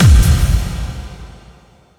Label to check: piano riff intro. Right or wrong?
Wrong